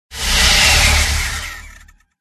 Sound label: buff, mantikora; pets